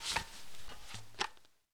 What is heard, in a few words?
generic cloth medium, weapons handling